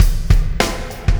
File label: Beat, Processed Drumkits